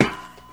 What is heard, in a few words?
ladder step; Original TK Sounds